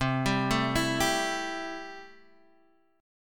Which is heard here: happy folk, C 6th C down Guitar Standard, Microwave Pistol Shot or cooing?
C 6th C down Guitar Standard